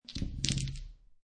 Dice Throw Five Dice On Felt; Casinos

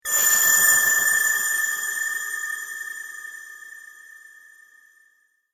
Eerie Chimes Scary Suspense Dark Metallic; Horror; Scary Tones; BBFX

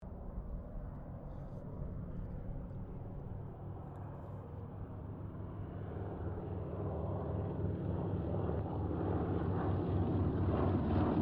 C195 squadron 2 pass, Airplane; Squadron of 2 Cessna C195s; Airplanes (Sound Effects:Vehicles)

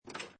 Pinball Sound Metal Rail Or Parts Rattling; Video Game Sound Effects; Lynne Music

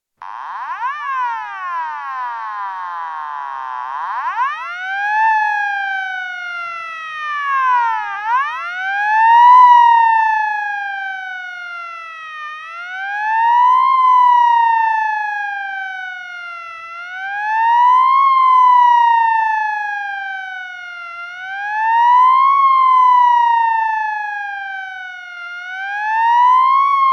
Ambulance wail siren, exterior acoustic; Used by St John ambulance services; Emergency Vehicle; BBC

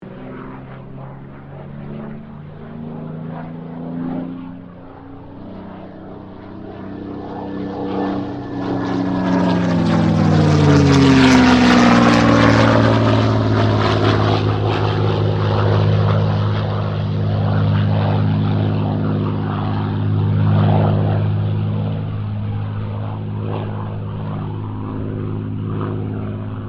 AIRCRAFT SUPERMARINE SPITFIRE MK-XVI PASS

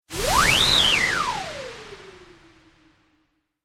Whistle Whoosh

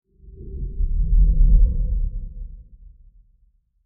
Short And Scary Transition Low; Scary Tones; Horror; Crafted Signals

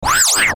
Ascend Wah Wah Chirp; Blastwave

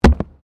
PLASTIC RUBBER PIPE; Material components for constructing more complex sounds; Materials

